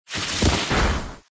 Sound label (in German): ruckzuckhieb; Pokemon Let's Go Pikachu; gamesoundopedia